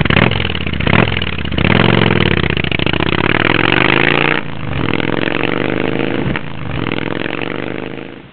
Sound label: start; K1400